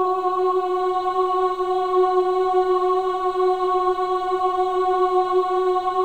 AH F#4, CHOIR AHS; Spectrasonics; Symphony of Voices

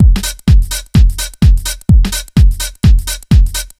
127 BEAT, Fresh Disco House; AKAI; USB Soundscan vol.20 - Fresh Disco House I

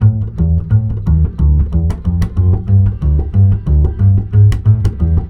BASS, Balkanish Traditions

